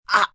Grunt Short Rasp, Grunts, groans, impact, female, short; Groaning